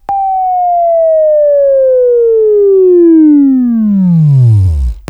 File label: Buzz